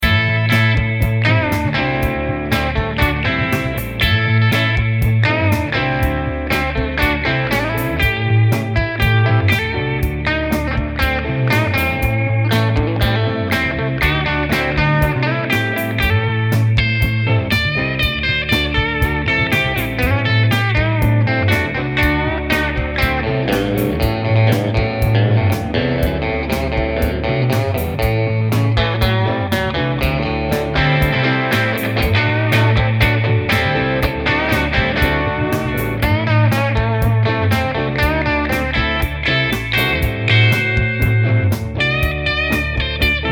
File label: bridge Clean